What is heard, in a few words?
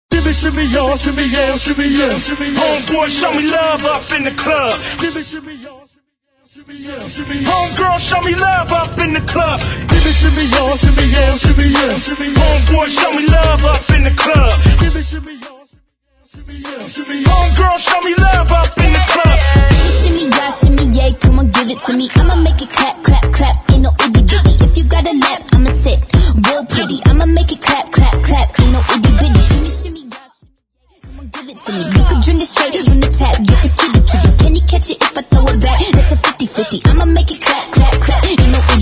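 Hype Acapella intro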